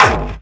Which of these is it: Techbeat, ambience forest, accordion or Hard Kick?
Hard Kick